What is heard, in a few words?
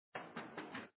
Steps